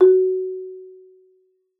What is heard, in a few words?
MAR F#4, MARIMBA; Xsample VOL-4